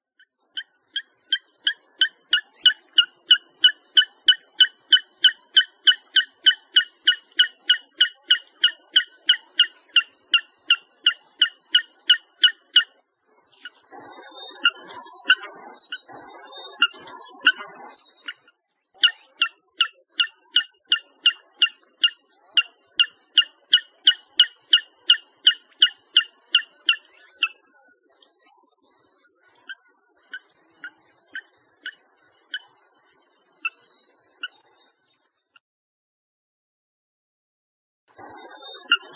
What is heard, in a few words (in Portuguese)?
Canto do franga dagua da inacessivel